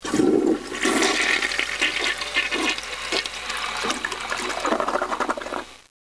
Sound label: FLUSH